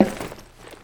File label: metal, footsteps; player